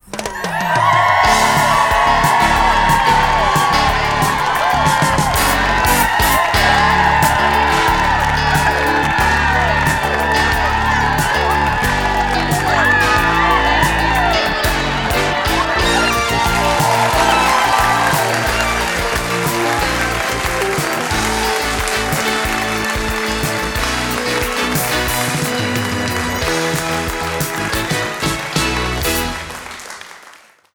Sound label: game show intro music cheer; overte; lastAvatarStandingTrivia